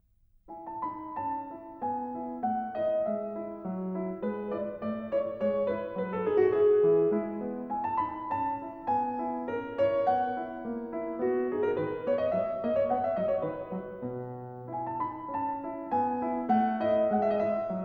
French Suite No 6 In E Major - 5. Polonaise, BACH KLAVIER